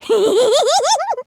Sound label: giggle